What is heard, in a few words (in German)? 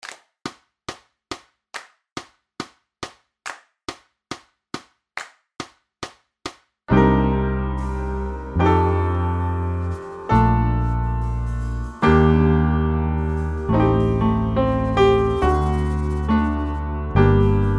ohne Melodie